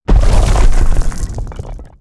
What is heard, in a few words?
dirt crumble explode; creatures organic